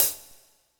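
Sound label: hi-hat, drums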